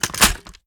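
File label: reload fast drum drum in, shotgun; gmod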